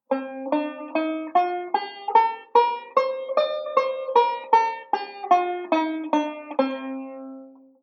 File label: C Dorian, banjo; Beyond Chord Melody Volume II